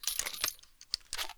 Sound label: m60 belt insert; mg; garrysmod